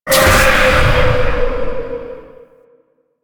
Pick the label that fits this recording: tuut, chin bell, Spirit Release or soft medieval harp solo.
Spirit Release